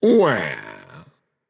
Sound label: boo hoo; smite; achilles